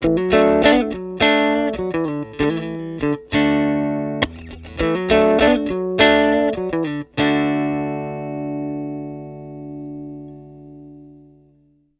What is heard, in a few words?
STRAT POS 2 LICK, S pickup set; STRAT POS 3